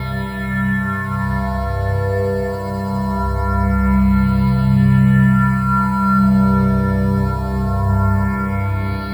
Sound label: Ambidrone; Sonic Foundry (Sony Creative Software); Numina II